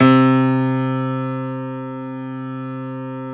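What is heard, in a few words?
piano, grand; Sound & Vision; Gigapack I CD 2; PIA GRAND 1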